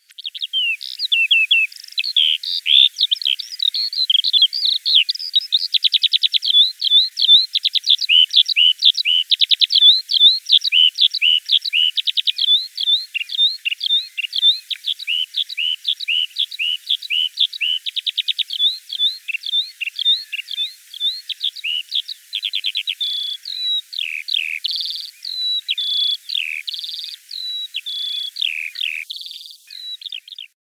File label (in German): Feldlerche Gesang; uni-kl